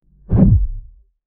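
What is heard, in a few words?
bounce sound effect gentle; notifications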